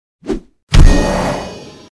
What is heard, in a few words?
attack; hero